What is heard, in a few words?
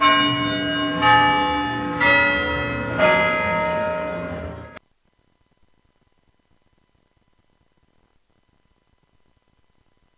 big ben